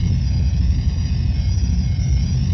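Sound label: engine run X-wing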